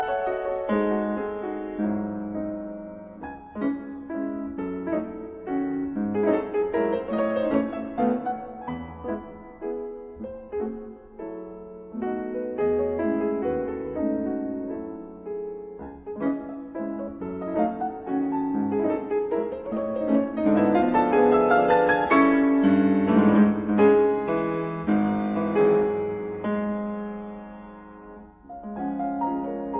Studies on the Chopin etudes in C sharp minor Mazurka; No 34; Op 25 No 5